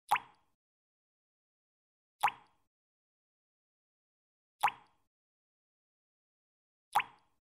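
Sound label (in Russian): voda kapaet, water dripping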